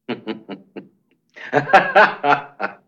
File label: Soldier Laugh